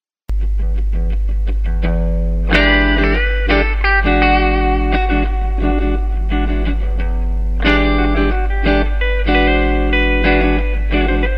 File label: jazzy